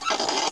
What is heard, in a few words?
Scale sinks down; Strategy Challenges Collection; CARNIVAL